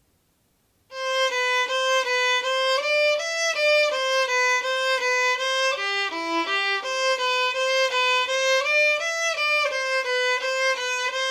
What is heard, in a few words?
Etude Allegro, violin; Wohlfahrt; Etude No 1 Allegro